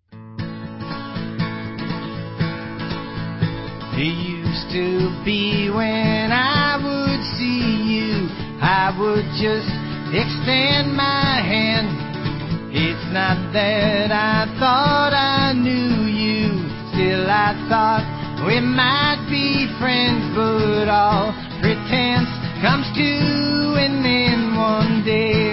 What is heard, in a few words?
Acoustic